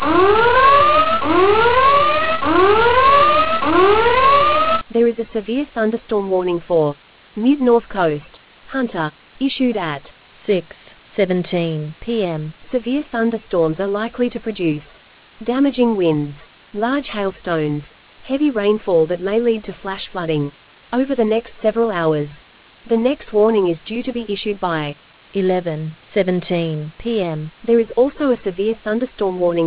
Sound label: storm audio